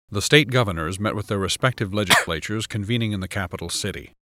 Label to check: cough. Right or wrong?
Right